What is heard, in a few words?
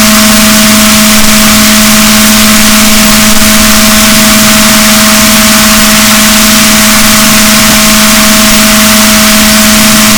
rorquals negatif, boat